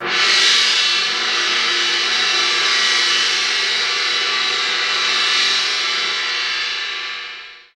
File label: CYM, Orchestral Perc; Roland LCDP03